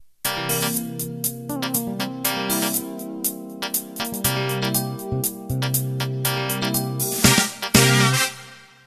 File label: Acid Jazz Intro